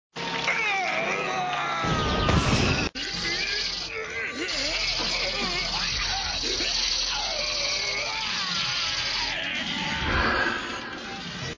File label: screams